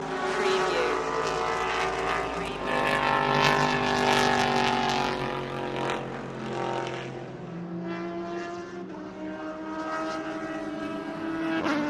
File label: car racing ambience loop